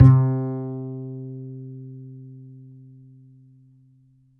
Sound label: D, Upright Acoustic Bass